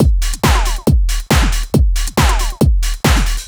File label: Dance Drumloop; Drums; Dance Beats